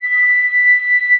flute

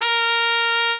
mexican trumpet

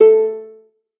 lyre

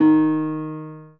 spanish guitar

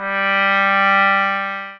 sax

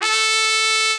mexican trumpet

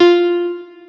piano

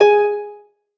lyre

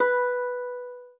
spanish guitar

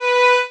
violin

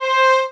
violin

